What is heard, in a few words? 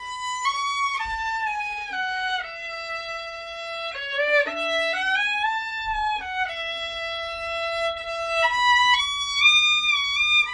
violin start; violin melody, which starts two seconds into the accompaniment; franck